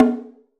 mid tom b key, tom one shot tuned to the B note. Loudest frequency: 430Hz